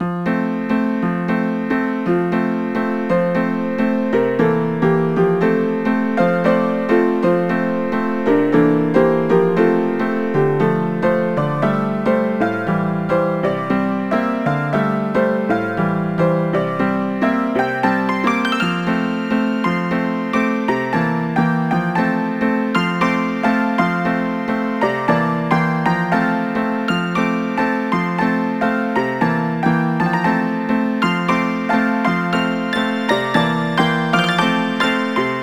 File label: Dance Walzer Fast; knigge spielend zum guten benehmen; nds